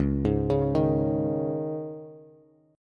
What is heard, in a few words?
Db Suspended 2nd, Bass; arpeggiated; Dbsus2; JGuitar